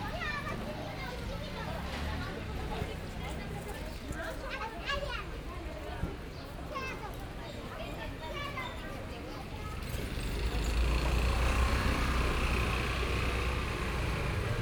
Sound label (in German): Boudha Strassenmusiker; Boudha, Kathmandu, Nepal